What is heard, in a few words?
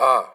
UGH, Vox